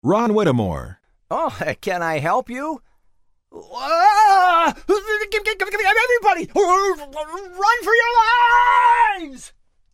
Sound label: screaming; Scared